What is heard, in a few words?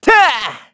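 Vox Attack, Korean; Evan; kingsraid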